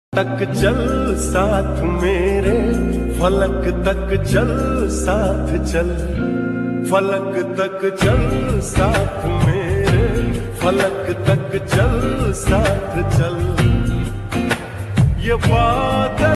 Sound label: lofi song love lofi song slowed reverb